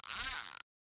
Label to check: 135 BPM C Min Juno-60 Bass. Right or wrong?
Wrong